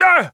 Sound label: Wizard Pain